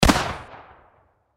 Gun Shotgun, Shotgun 12 Gauge Remington 870 Pump Action Single Shot; Close Perspective; Shotgun Sound Effects; Blastwave; Warfare